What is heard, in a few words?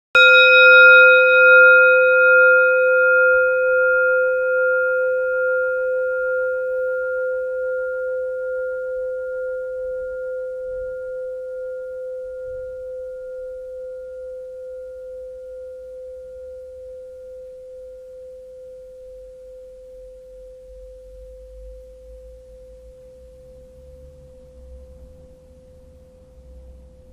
Ding, meditate